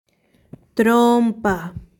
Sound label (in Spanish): trompa